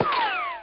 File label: bullet whiz